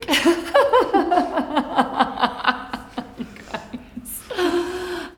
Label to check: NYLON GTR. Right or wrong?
Wrong